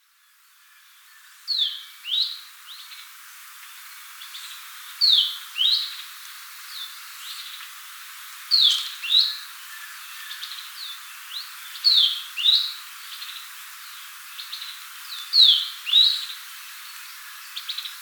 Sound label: Atlapetes schistaceus Atlapetes pizarroso